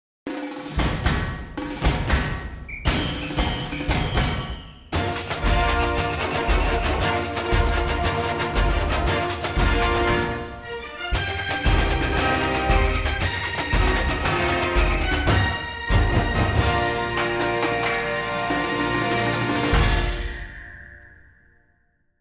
Fanfare (Samba Version); Rio 2

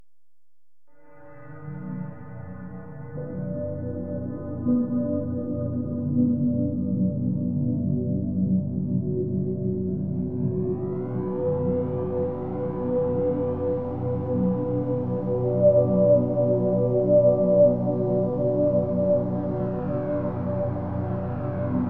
Deep Sea Underwater Ambience; hotusa spa

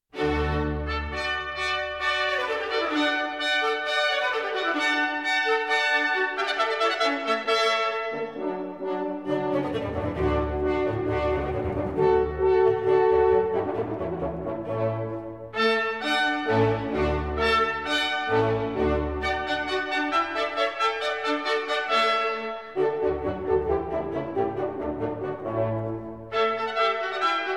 Water Music, Overture; Clasica; Handel; Suite No. 2 in D Major

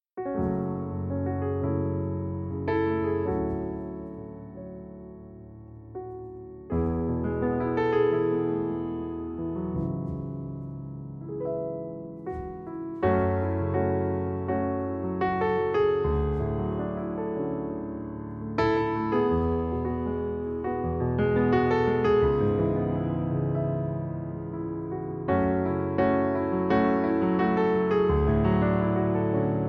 Piano Version

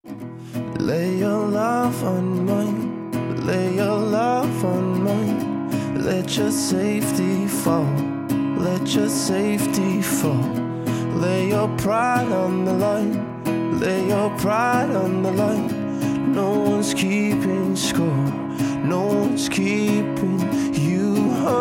Ocean (Stripped Acoustic); SOFT ROCK ACTUAL

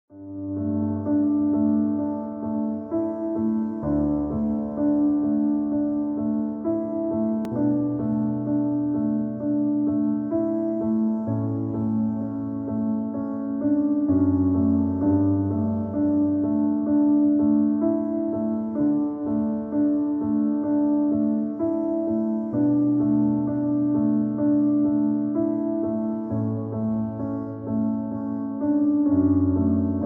Piano Relaxation

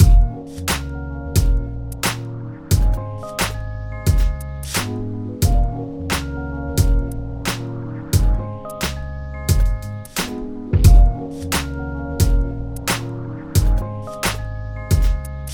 Xilopsycho Beat, ambient y chill out

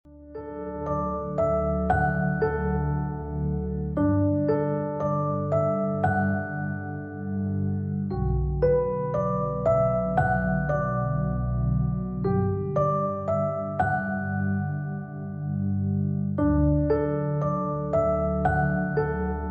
Piano Relaxation

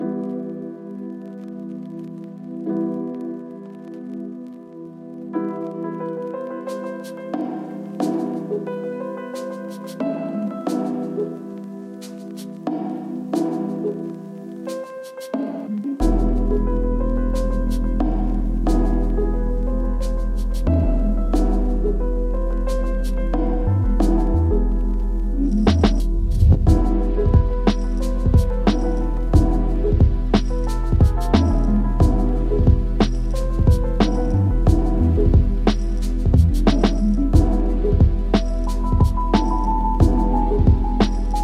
instrumental lofi

